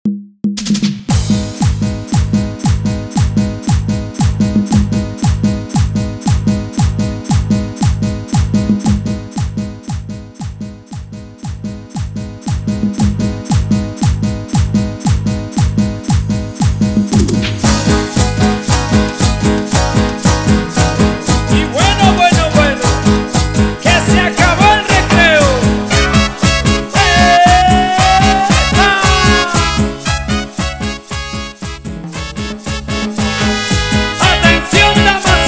Intro Simple Bpm 116

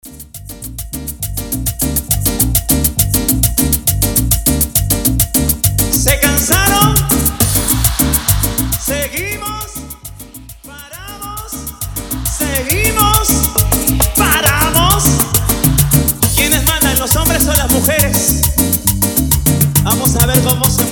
Intro Animacion, 136 Bpm

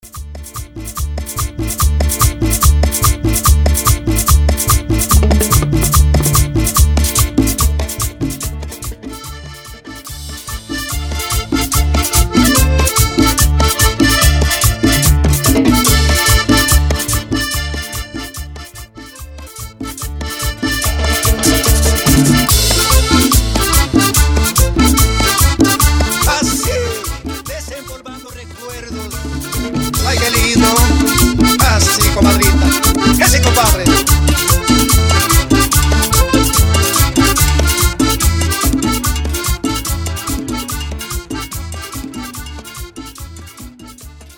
Intro Simple Acordion, Bpm 145

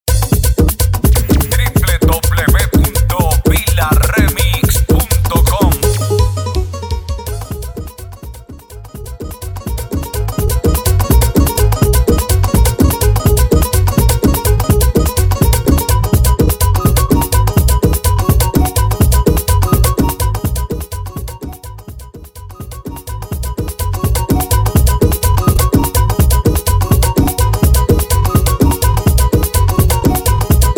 Bomba Instrumental, Extended Correte, Bpm 167

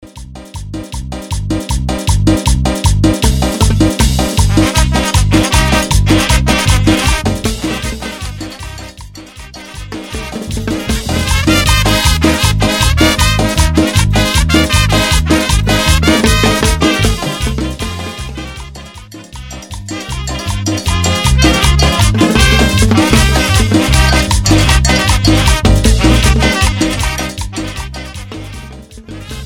Banda Bandita, Bpm 161

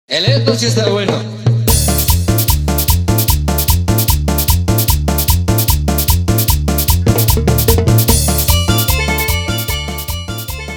Instrumental Nacional, BPM 150

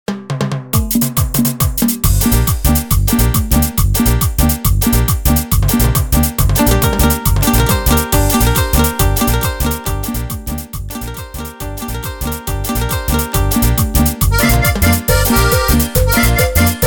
Instrumental

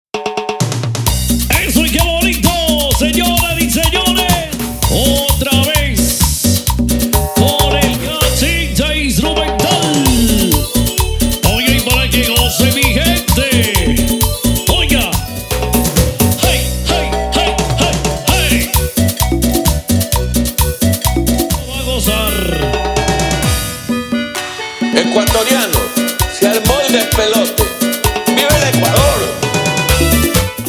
Mosaico Instrumental Chichero, BPM 130

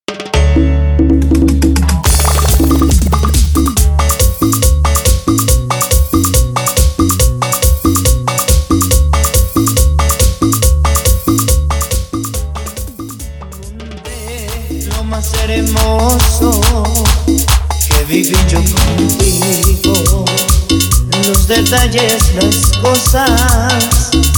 Intro 140 Bpm

